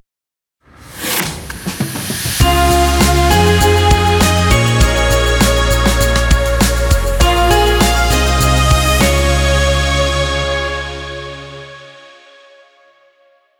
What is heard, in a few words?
Jingle